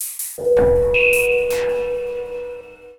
PERCUSSN, AMBNT, 160; Ambient; Drum

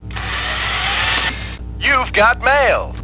Robo Mail; MyStep Mail Notify Block

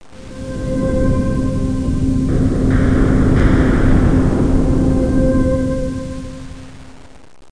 esplode

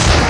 bash it; Total Chaos Nukem 3d Pack; duke

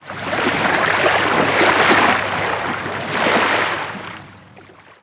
water lap

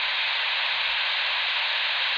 radio noise; specops

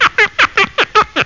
cleaner; Take 2 v1.0

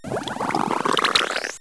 Sundrop; Treasure Galaxy (1994); Learning Company

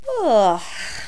YAWING; Spanish Net; ATTIC

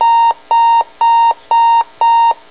alarm

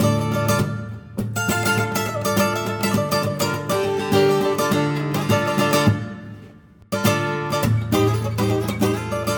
Swing, Acoustic Guitars; ACID MUSIC; Swing Funkston

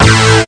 tin town guitar, Synth; FreelogHS10

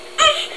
pain; QUAKE2

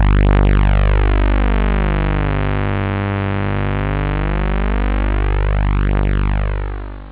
synth

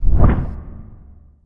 wings; Earth and Beyond; EA Games

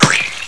barf; Alien

